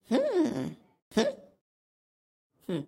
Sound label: build idle; Tom build